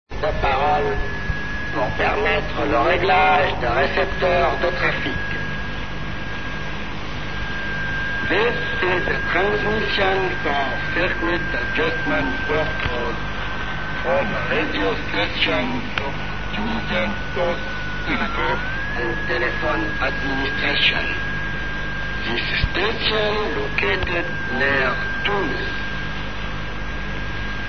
usb, utility radio stations

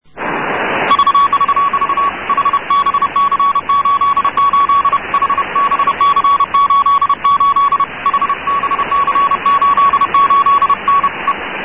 cw, usb, utility radio stations; icrc n'djamena'ttr88'

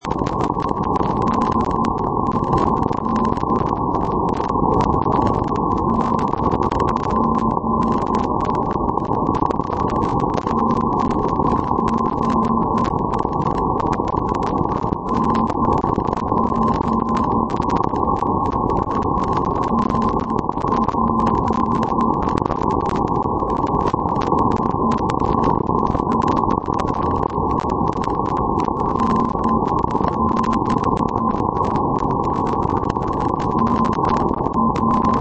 ndb tomaszow

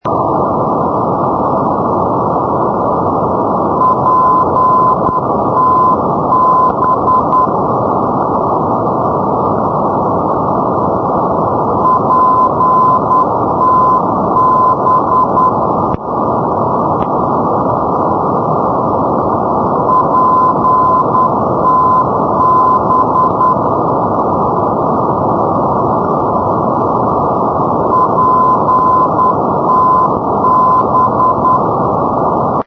ndb pusztaczabolcz; poellau

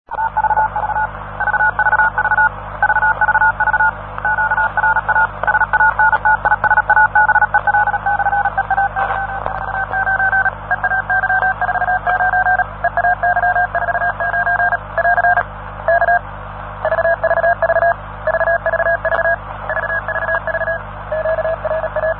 cw vvv

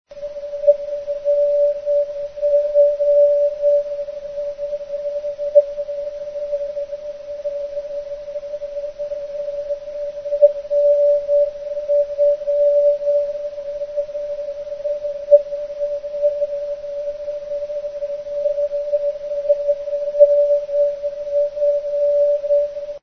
ndb norfolk; michael oexner ndb handbook

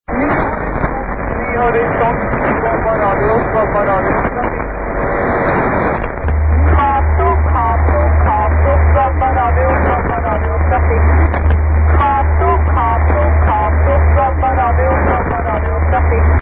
svalbard radio; europe

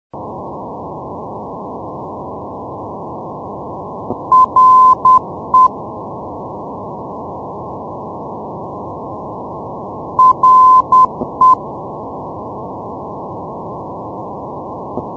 ndb reykjanesskoli

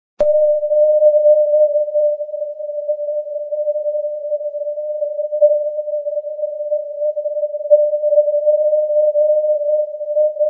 ndb + ndb; vigo vi-345; mrc-249 ndb daouarat csd-345; europe

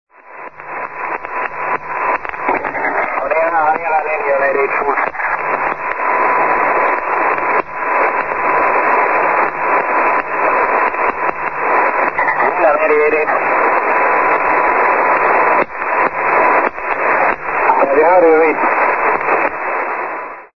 aero usb; nandi; honiara

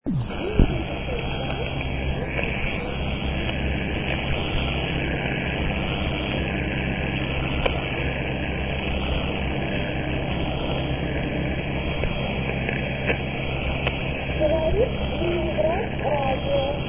leningrad radio usb; europe; rainer brannolte

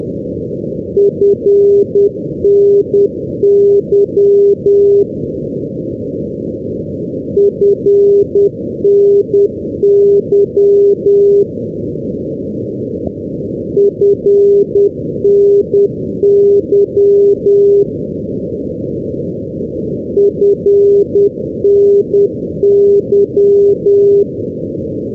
ndb robin hood airport doncaster; europe

